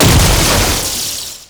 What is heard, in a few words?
Stalker Jade Scythe Proj Die; Warframe; Weapons